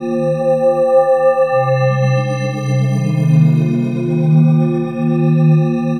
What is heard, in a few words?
SYNTH D+B 160 C, Drum+Bass, Keys; eJay